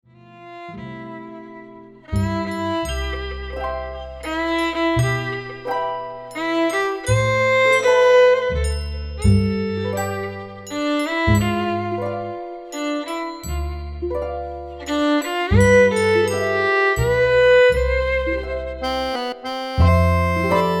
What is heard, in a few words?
Lullaby Brahms